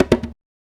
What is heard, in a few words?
African Perc; Spectrasonics; Supreme Beats; African Contemporary; SB Afro DRMs